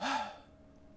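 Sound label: Longbowman Heal Vox; Civ4 warlords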